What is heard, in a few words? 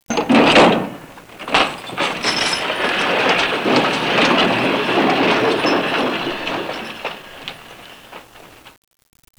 boxcar; svencoop